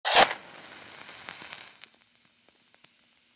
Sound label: Match Strike